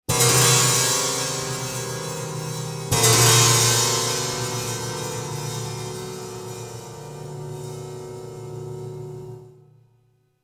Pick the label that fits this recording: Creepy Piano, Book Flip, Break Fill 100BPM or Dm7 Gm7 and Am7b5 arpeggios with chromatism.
Creepy Piano